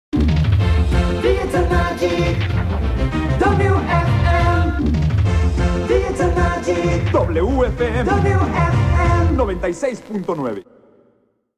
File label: Jingle, radio